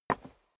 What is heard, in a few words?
step boots wood creak single stair down; schritte; Footsteps